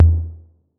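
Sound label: 808 low tom; Wizoo - Platinum 24 Electronic; CHR TOMS 808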